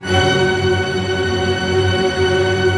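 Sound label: String Tremolo F#, Right; Orchestra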